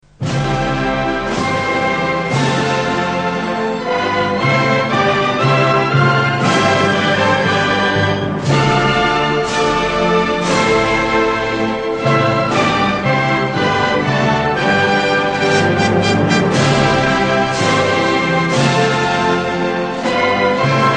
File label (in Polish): patriotyczny